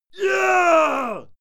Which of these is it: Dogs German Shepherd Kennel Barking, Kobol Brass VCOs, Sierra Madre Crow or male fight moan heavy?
male fight moan heavy